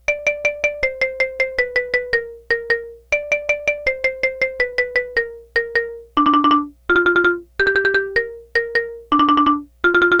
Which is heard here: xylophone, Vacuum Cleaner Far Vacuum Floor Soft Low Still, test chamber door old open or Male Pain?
xylophone